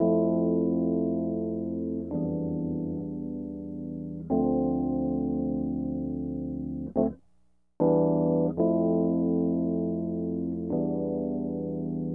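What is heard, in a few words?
rhodes, D minor, Hip Hop & RnB; ProSamples vol.34; Ballad de Boo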